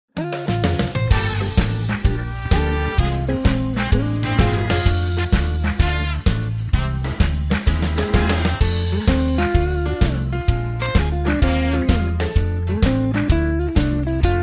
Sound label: harmony sample